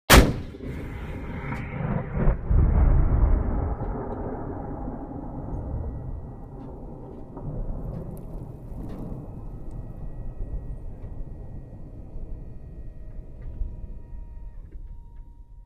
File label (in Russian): strelba tanka